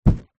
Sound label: balloon inflated catch; foley